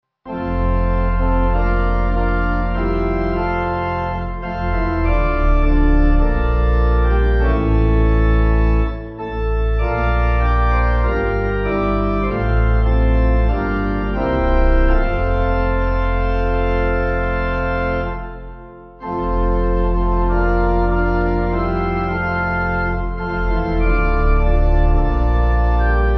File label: Lord You Need No, Pipe